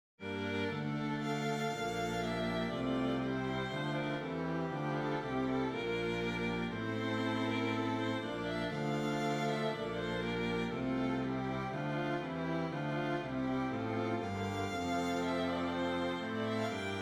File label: Hymn Strings